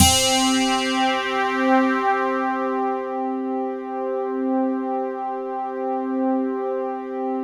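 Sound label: JV heaven guitar, JV-1080; Syntec; Wall of Sounds VOL-2; BASIC-JV1080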